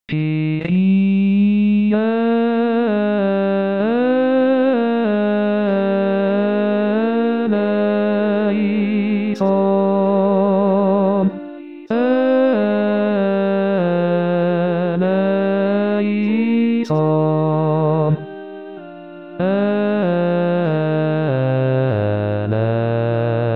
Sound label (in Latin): Missa de Angelis Kyrie Bassi; Bartolucci; messe